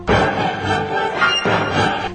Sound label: music